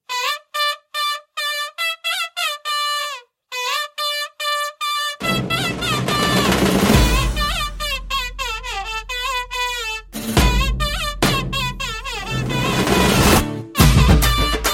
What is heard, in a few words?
Instr